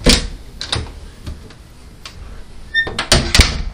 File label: door